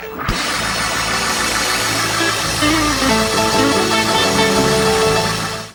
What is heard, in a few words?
gospel hit